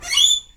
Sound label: tropical bird; animals